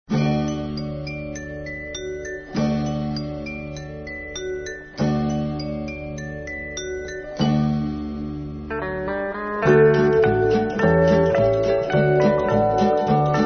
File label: Maori Chimes